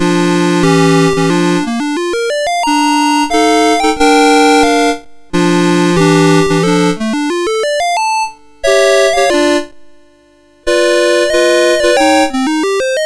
Bridal Chorus, MOS6581R2

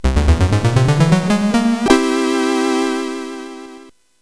Platform Game, sid CSG8580R5; hvsc

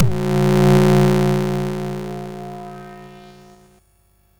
Jingles, sid MOS6581R4

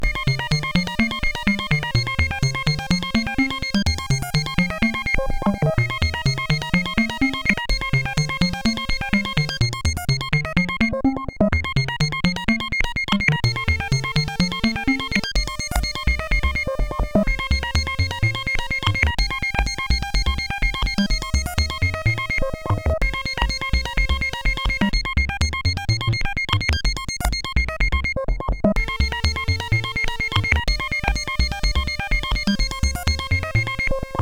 sid CSG8580; Easter Eggs

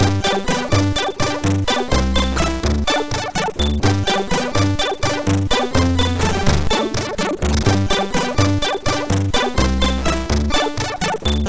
sid, MOS6581R4

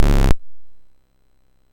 Dragonworld sid MOS6581R4

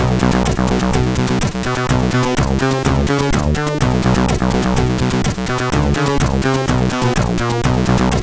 Disco Rythm, CSG8580R5; sid

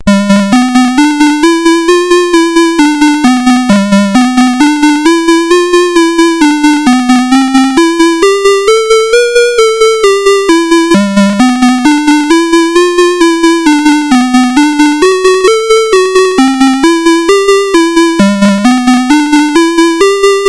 Bunny Hop BASIC, CSG8580R5

